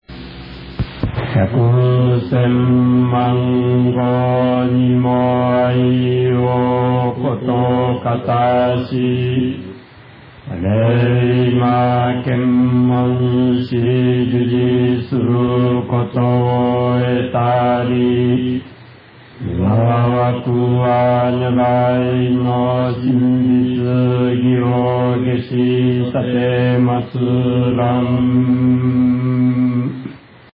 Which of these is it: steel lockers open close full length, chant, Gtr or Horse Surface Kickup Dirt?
chant